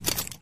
Robot idle

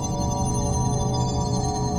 Magic Square effect

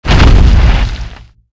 BodyFall

